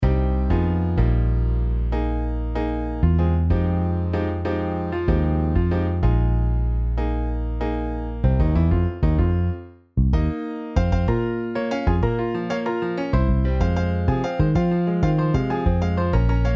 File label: Piano Bass MIDI